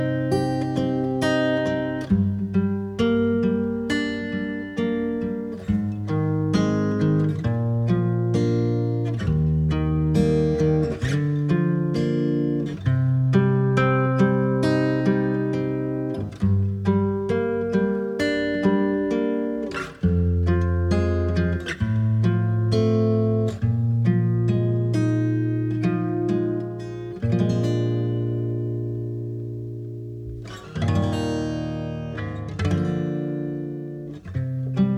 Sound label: Instrumental